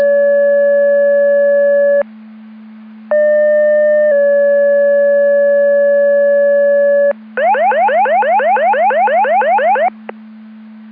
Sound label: Siren; Andover Twp Boro